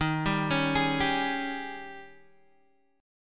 D sharp 11th, Guitar, DADGAD

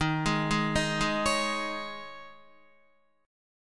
D sharp 7th Suspended 4th, Guitar, chord; D#7sus4 arpeggiated; JGuitar

